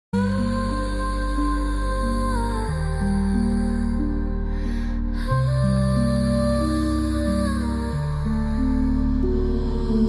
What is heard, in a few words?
Cinematic Lo-fi Rap